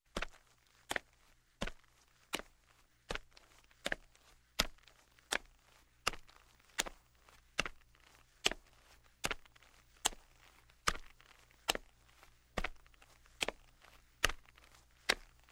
Footsteps, Linoleum - Sneakers; Sound Ideas 2000 Series

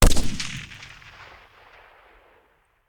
Machine Gun; Sound Ideas; Guns

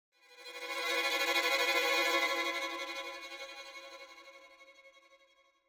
Symphonic Hit Strings Tremolo; Hollywood Edge; sound designer's toolkit v2

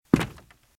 Footsteps - Wood - Creaky - Walk - Right

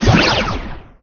Pickup Scifi Energy; SFX Kit

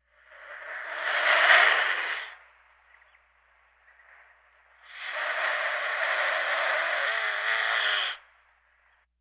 Birds

